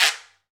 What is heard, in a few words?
Guiro Wood; Club-50 - Foundations Roland